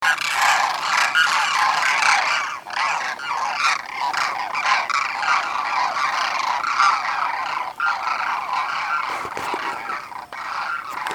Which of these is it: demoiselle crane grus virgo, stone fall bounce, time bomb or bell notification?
demoiselle crane grus virgo